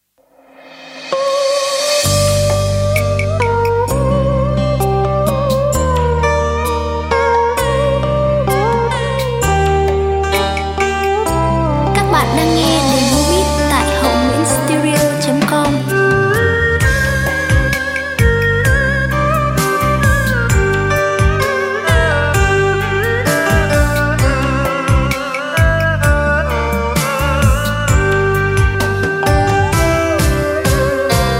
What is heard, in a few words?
beat, playback